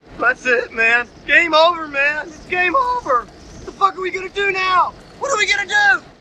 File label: game over